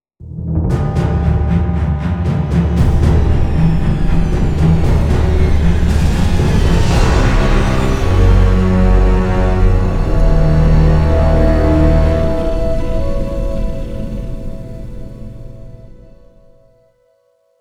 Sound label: Fail; Sniper Elite 4